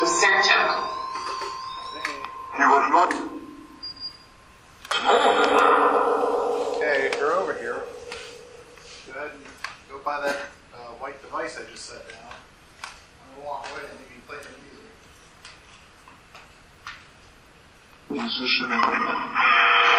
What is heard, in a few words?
Phasma Box; beaver area high school investigation